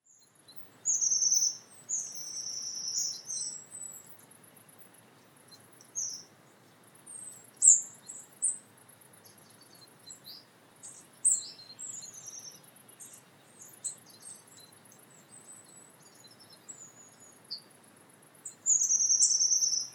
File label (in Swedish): Stjärtmes